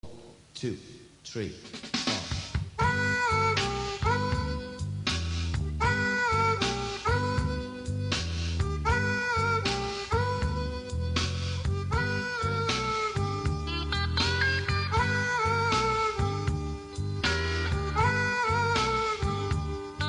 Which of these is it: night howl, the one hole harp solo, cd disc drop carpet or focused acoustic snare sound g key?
the one hole harp solo